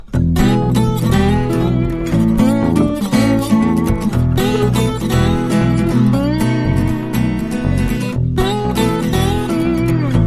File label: Happy, No Drums